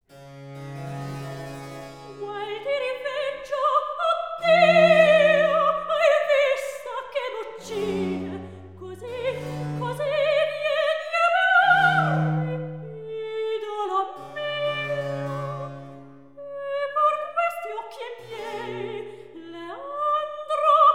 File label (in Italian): Recitativo; Ero e Leandro HWV 150; Qual ti riveggio, oh Dio; Handel Masterworks; Klassika